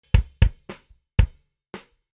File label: kick